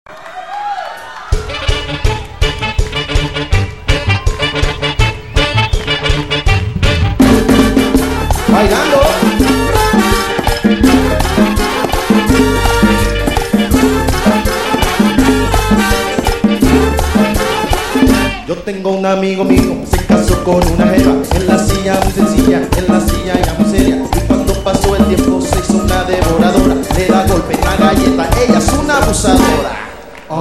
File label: Merengue, ritmos latinos Colombia